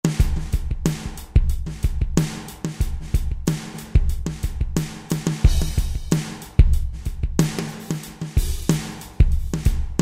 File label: FAT Comp. KIT; Roland SR-JV80-10 Bass & Drums